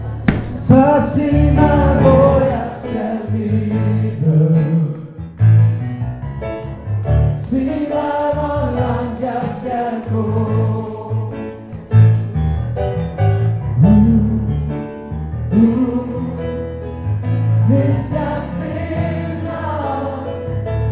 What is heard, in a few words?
tenor; kor